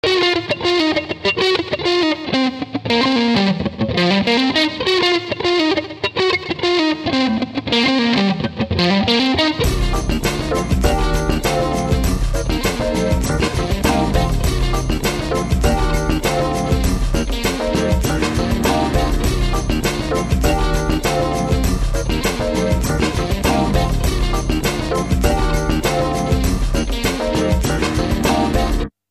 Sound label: Funky Rhodes